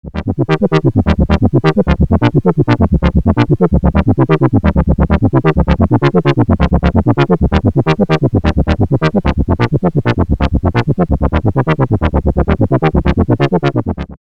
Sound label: ARP Avatar VCF via Velocity PWM Mod via OSC 2